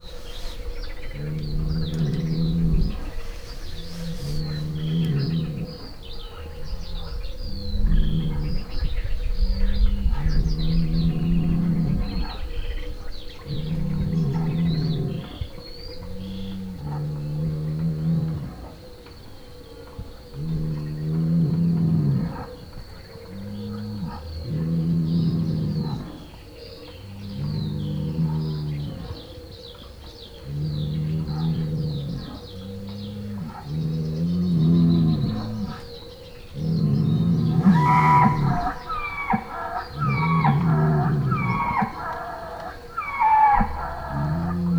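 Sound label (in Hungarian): tulok kulán szélcsend, ősz tulok; Hortobágyi Nemzeti Park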